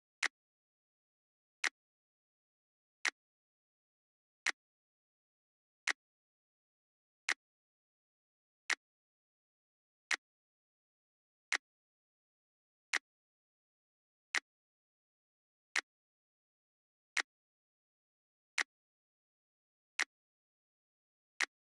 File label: Drum Loop, 85 BPM Snap; Loop Stems; Cymatics; DOPE DRUMS VOL. 1; Find Drum Loop